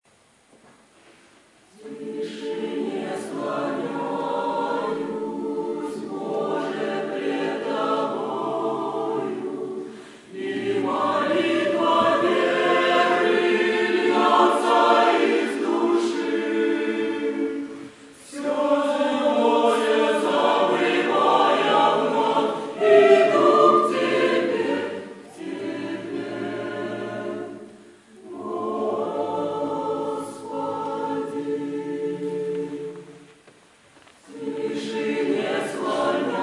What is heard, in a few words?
choir